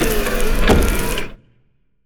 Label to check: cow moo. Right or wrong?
Wrong